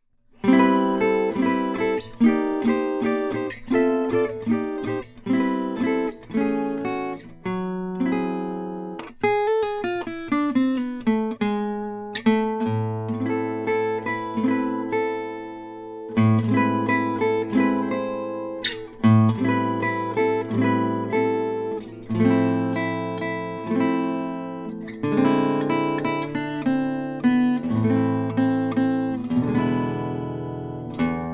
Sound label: Modele Elan 12 gypsy jazz; 2008; shelley d park